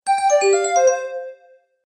Magical Message Notification; Musical Sound Effects

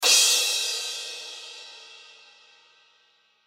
Drum Hit Cymbal, Drum Hit Cymbal Crash; Cymbals; Musical; Blastwave

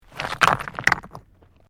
STONES MOVE

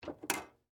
ATM Door Close, Industry, Atm, Door, Close; Machinery; BlastWave

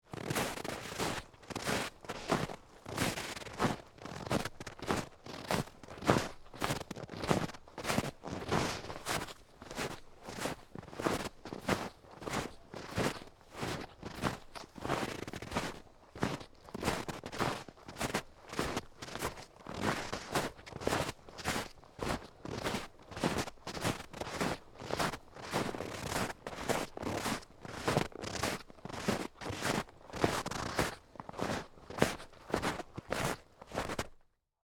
Snow Walk, Footsteps, Through, Forest, Long; Footstep Sound Effects; BlastWave

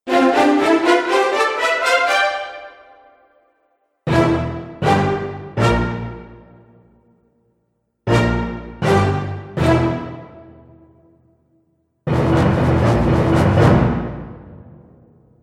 Action Film Dramatic Event Collection, musical

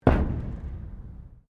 METAL IMPACT THUD; Materials

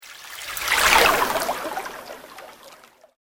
Sweep Organic Slow; Created sweeps, swooshes and other movement effects; Sweepers; Sound Design; SoundEffects-Foundation4

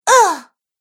Woman Groans Ugh; Groaning Sound Effects

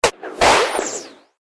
Droid Grappling Hook Fail, robot; Robot Sound Effects

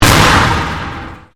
Convolution Pulse

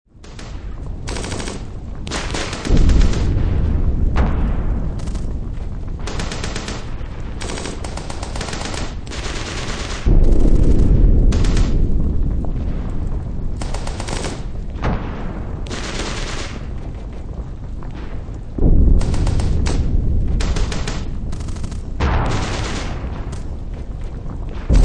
Warfare World War Two Battlefield City; WWII; LYN083 Warfare Battlefields sound package; Battlefield Sound Effects

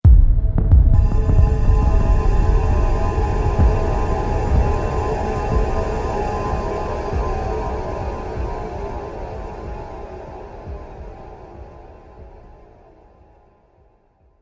Percolating Fear Trailer Hit

